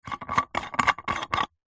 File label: household lightbulb unscrew from plastic lamp